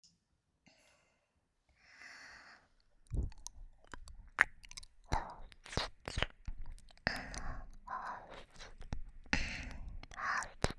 耳なめ（右）

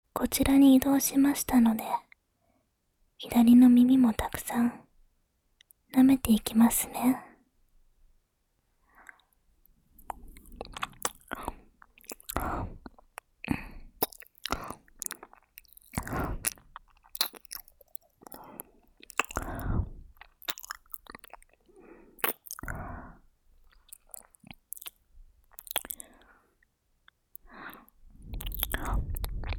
耳なめ 左

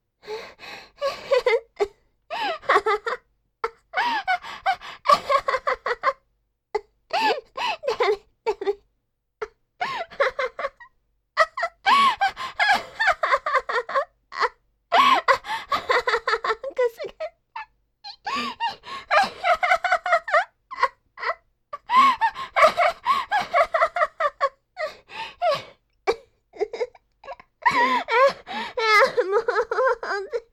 罰ゲームでひたすらくすぐられる女の子の音声♪

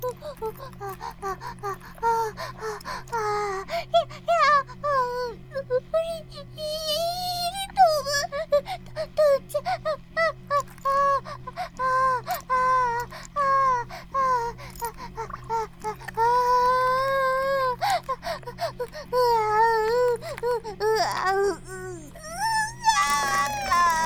バイブ放置, ASMR

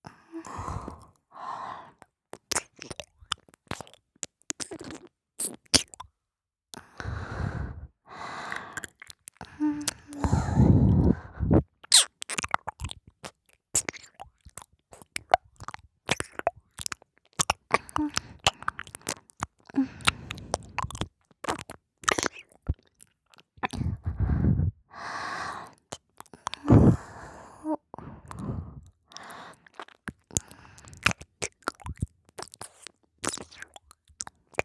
ちゅぷっちゅぷっ, 左耳, ASMR

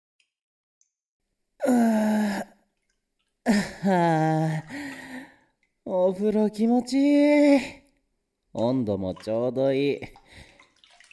お風呂

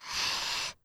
ブレスロング

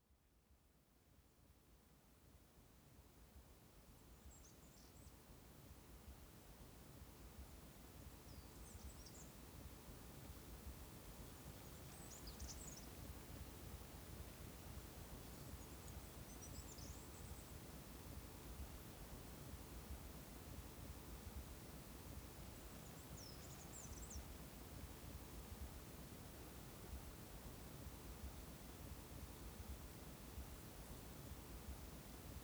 滝の音、表からと裏からと。タオルでふきふき; 裏見の滝

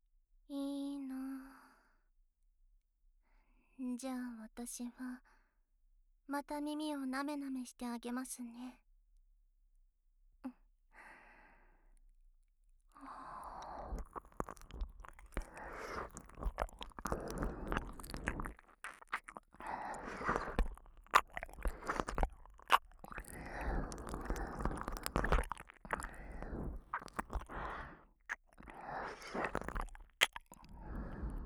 耳舐め, 姉 キス 中, SE なし

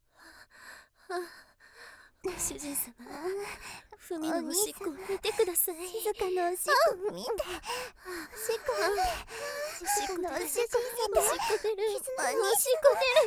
3人同時ループおしっこ

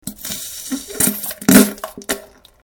おならのいきおいでうんこ

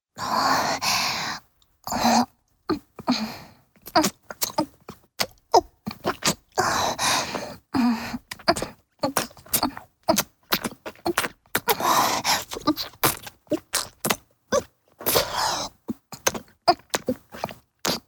チュパ音, 高音強め; フェラチオ(中):一定の調子で頭を前後に往復して陰茎をしゃぶる; チュパ音抜粋集

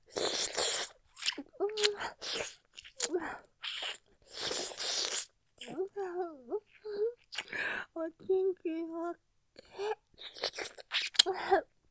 舐め◆激しくじゅるると; おちんちん手コキ; ループで楽しむ！シェフの気まぐれ抜き抜きコース; モノラル

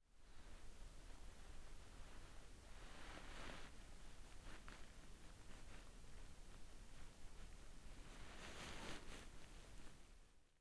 衣擦れ軽く動く; 夏実の膝の上で衣擦れ

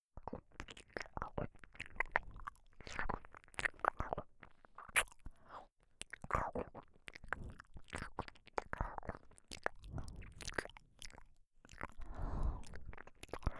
耳甘噛み

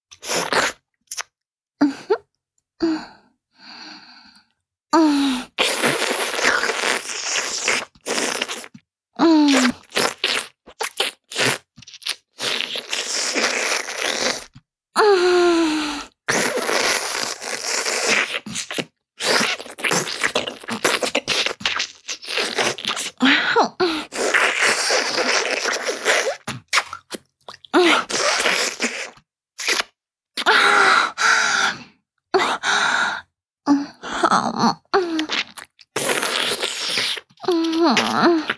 フェラチオ(弱):頭を前後にゆっくり往復して陰茎をしゃぶる, チュパ音; チュパ音抜粋集

